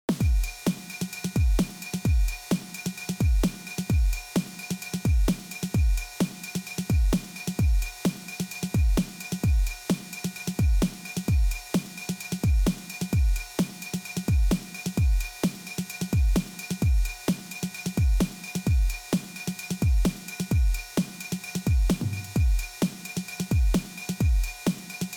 Jungle; MDrummer

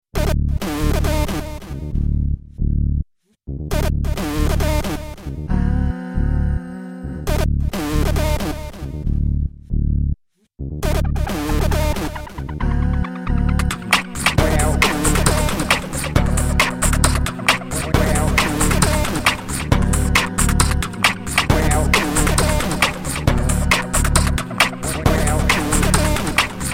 Vocal Groove

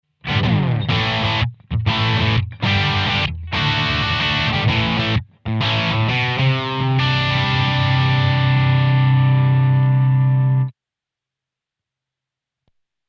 Amp AC Combo; Simlpifier; Amp AB; Gain on 3

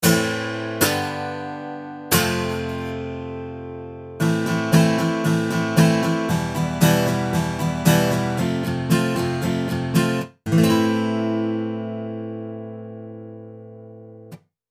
Acoustic

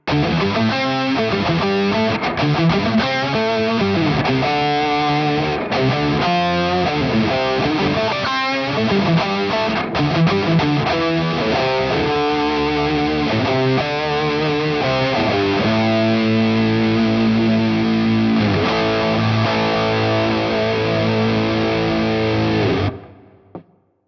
Headrush SYN Square Lead

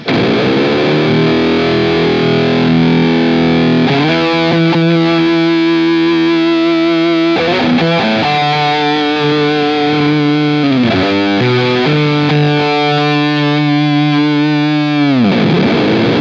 Tropical Fuzz Nectar All In; Beetronics Abelha

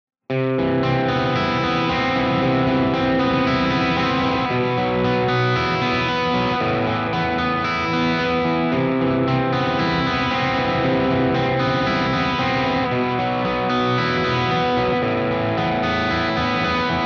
crunch Arps; MGK Signature

